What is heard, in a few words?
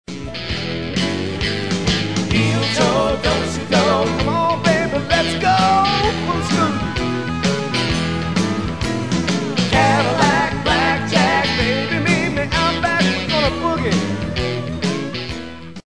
country